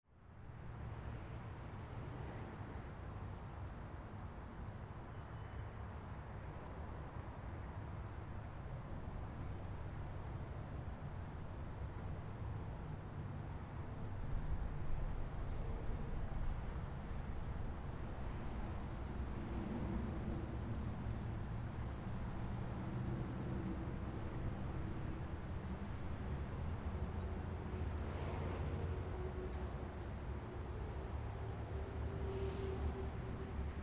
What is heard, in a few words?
Traffic Distant; Traffic And Car; Ambience; Traffic and Cars; Blastwave